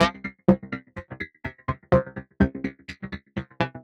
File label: synth 125 flange beat C; Transmission-X